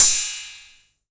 drum crash sound d sharp key, cymbal

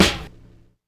large room steel snare drum c key

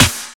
clear steel snare drum sample f sharp key

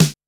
00s sparkle snare sound d sharp key; snare drum samples

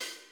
dry open hat one shot C key

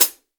clean pedal hi hat sample C# key; hat samples; C sharp

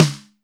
boom acoustic snare sound d sharp key; Mono; snare drum samples

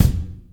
bass drum one shot f key; kick drum samples

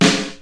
natural snare drum sample g sharp key

